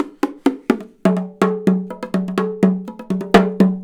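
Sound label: timbales, Indian Traditions; USB Soundscan vol.45; AKAI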